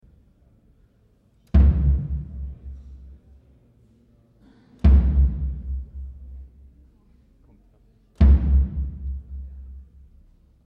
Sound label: Elephant Noise Trio Elephant Noise